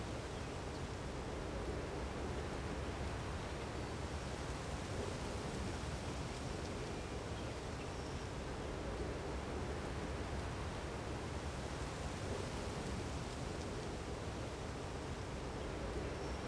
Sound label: forest ambience; Star Wars Obi Wan; XBOX